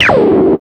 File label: RADIO, 160 BPM; Ueberschall; Drum & Bass Flash Trackz